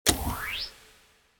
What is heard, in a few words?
Menu Button Back